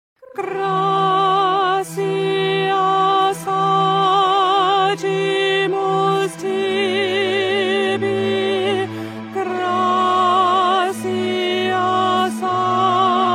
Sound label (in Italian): contralti; GLORIA VIVALDI